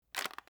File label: Paper Cup Crush; Fairlight Sound Lib